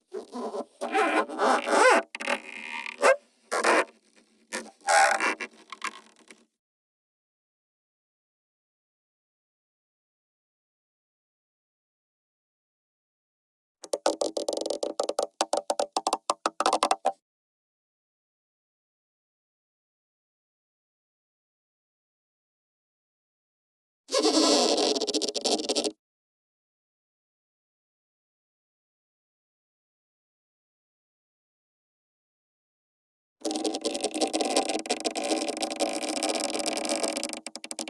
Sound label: squeaks and creaks window rubs; fvtt sonniss gdc audio